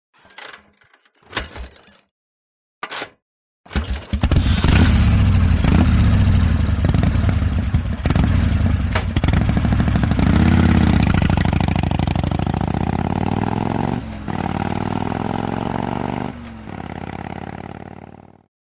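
zaveli motoc s nogi i uehali, kick-started the motorcycle and rode away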